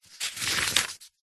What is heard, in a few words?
book large thick page turn; zapsplat